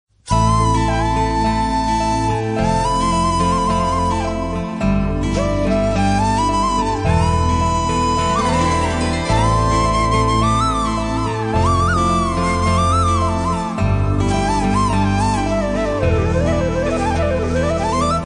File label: flute ringtone